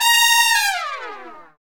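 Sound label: trumpet fall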